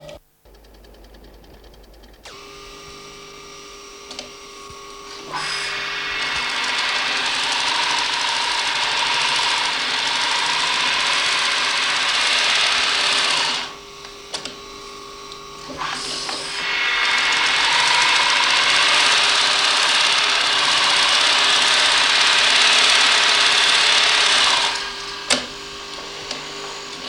elektricheskaja shvejnaja mashinka

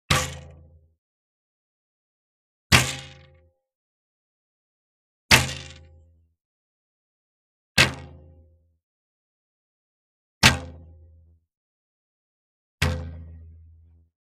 popadanie v mishen iz luka

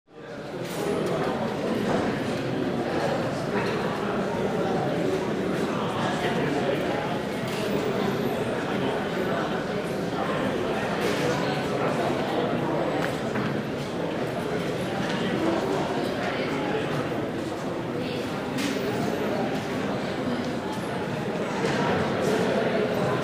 zvuki kafe

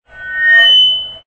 fonjaschego mikrofona

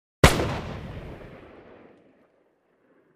zvuk snajperskoj vintovki

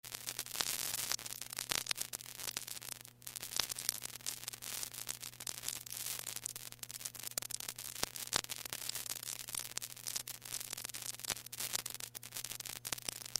tresk plastinki grammofona

